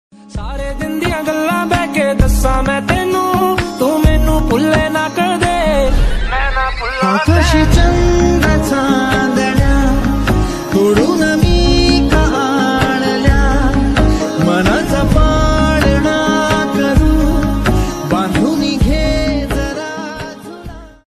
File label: marathi love ringtone song